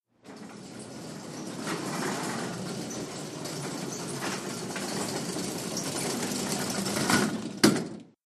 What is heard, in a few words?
Gurney Cart App Fast; gurneys